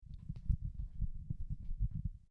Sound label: Hand Samson Q8